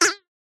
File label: cartoon spring small ping twang